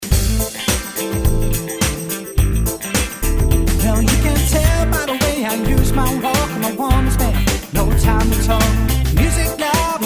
Disco Medley

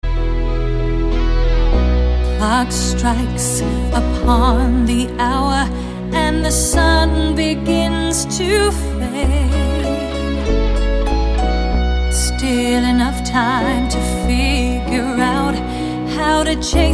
Vocalist

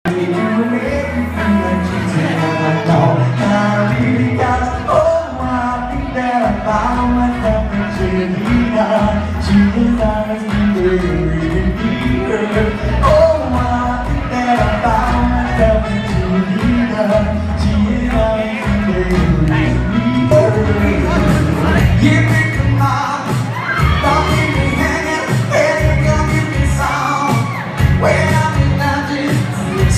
vocal live

